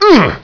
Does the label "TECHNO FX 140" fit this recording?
No